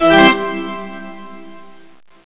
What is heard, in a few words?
organ